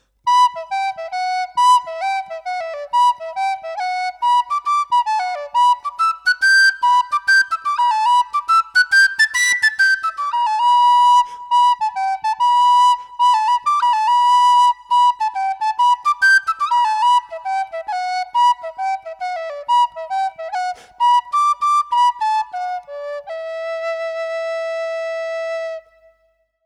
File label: whistle D rosewood